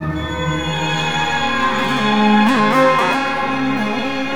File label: STR, STRINGS; USB Soundscan vol.03; AKAI